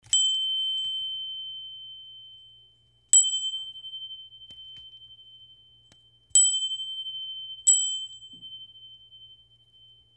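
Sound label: wind chime; furin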